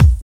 Short Kickdrum Sound D# Key, Royality free kick sample tuned to the D# note; kick drum samples; D sharp key